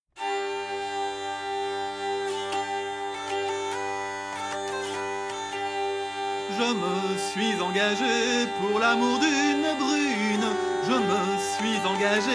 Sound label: Tavern; assassin s creed unity gamerip 2014